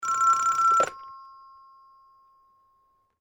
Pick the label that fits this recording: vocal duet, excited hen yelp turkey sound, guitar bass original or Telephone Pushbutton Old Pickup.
Telephone Pushbutton Old Pickup